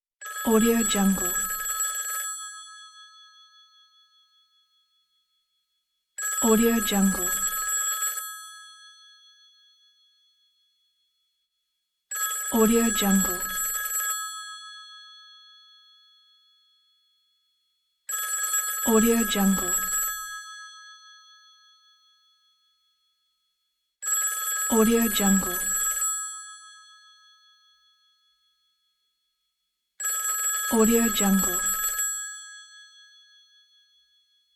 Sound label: telephone ringing